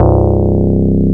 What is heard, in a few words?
PUNCHREEDS; Wizoo - Powered DX